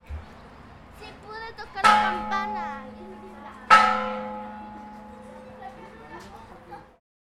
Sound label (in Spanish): Campana trisada; mapa sonoro